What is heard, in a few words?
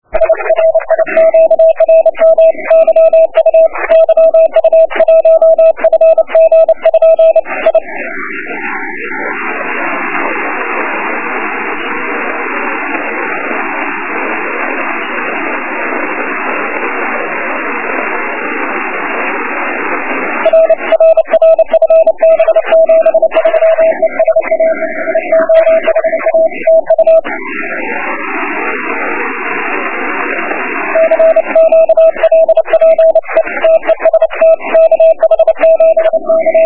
Morse on 28Mhz